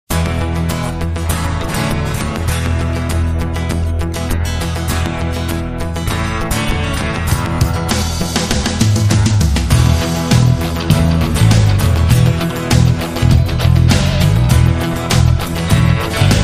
Techno Folk

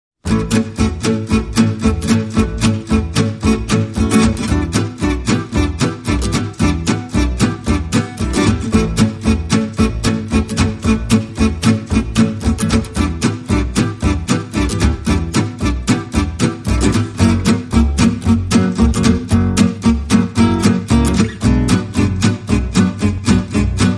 No Percussion No Lead Melody

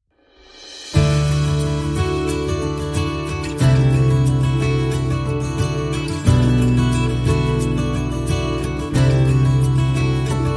No Strings

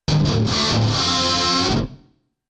Solo Guitar Riff; Solo Guitar Riff 9

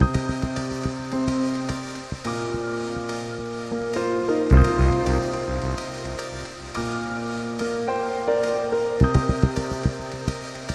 Pastoral Cycle Piano Mix; 30sec

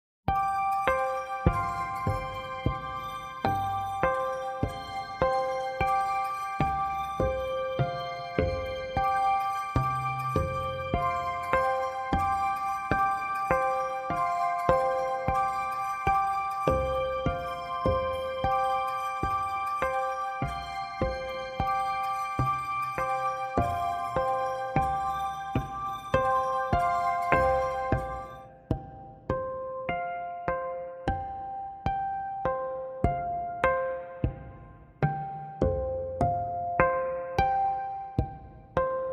High Harmonic Shifting Bells

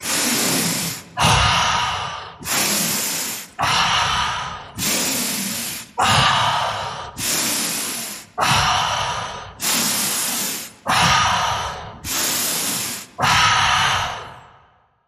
Deep Breathing

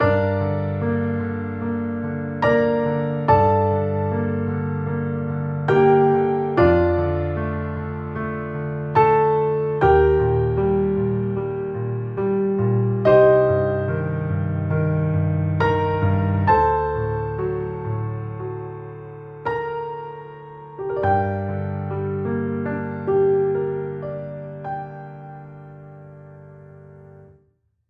Piano Intimate; 30sec

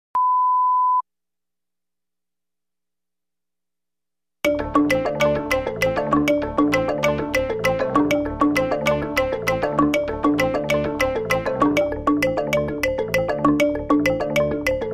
Tuned Perc

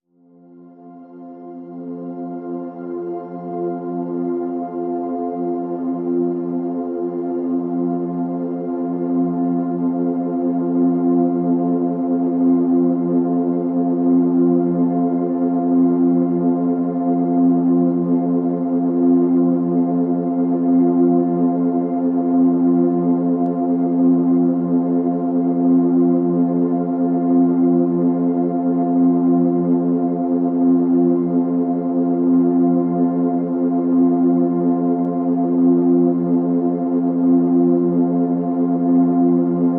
Pads (F)

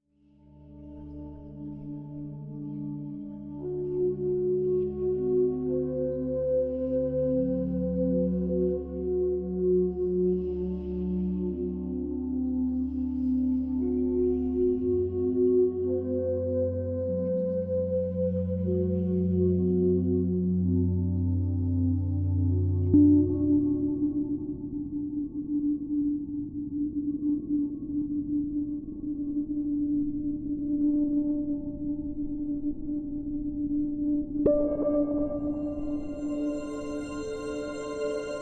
Drone, Light

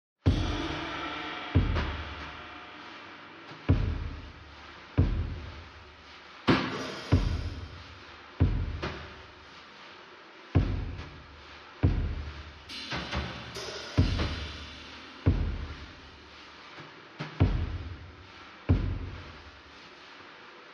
Drums Only

